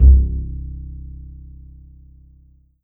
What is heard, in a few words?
Yamaha TG77 Woodbass C1